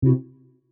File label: SE sys cyber cancel